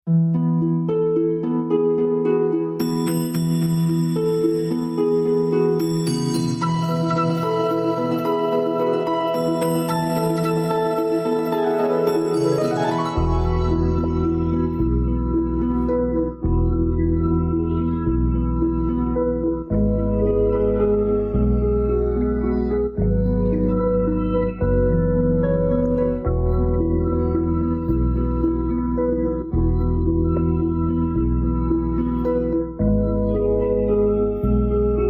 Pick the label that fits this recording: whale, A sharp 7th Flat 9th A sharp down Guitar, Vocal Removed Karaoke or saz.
Vocal Removed Karaoke